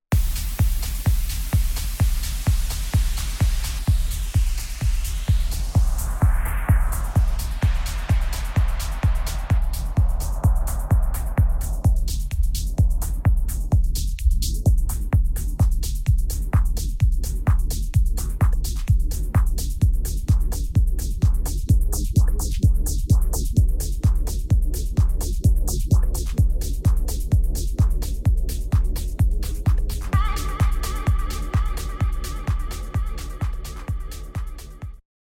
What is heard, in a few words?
RMX 60 Phase